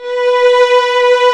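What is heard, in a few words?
strings, 8pc, vibrato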